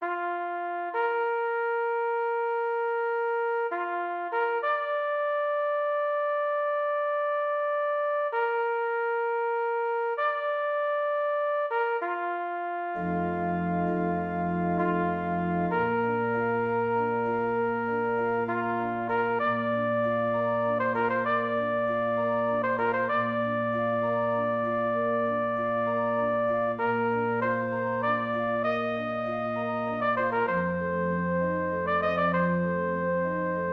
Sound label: Trumpet in Bb